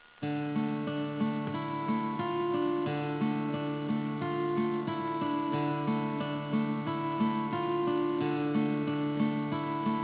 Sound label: bgm